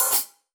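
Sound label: Sab Open Hat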